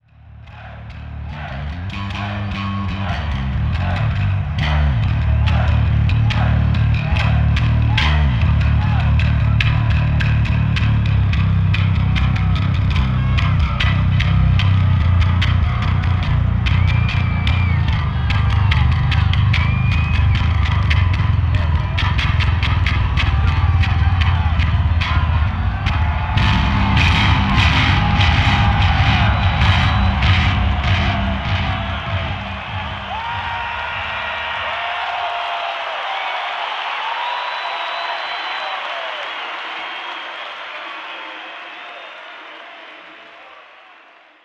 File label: Bass Solo